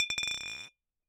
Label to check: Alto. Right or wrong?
Wrong